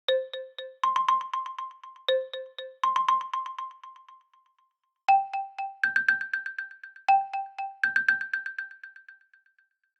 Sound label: bounce, ringtones; OnePlus OxygenOS